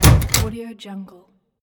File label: safe door close lock